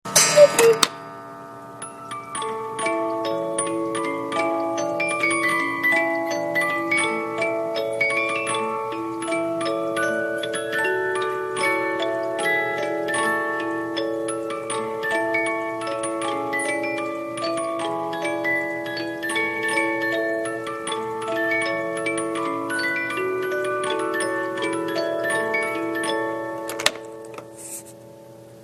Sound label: musicbox cuckoo, clock